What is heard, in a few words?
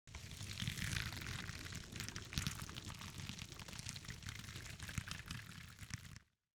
Subtle Constant Flesh Burning Open Flame